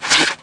Small Spear Tail, Aliens; Small Spearleg

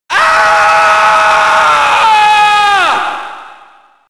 fire death; characters

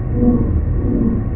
Sonic Loop, Sonic Gun

